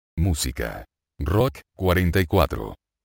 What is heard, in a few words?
rock